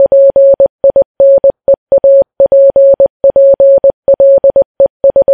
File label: tick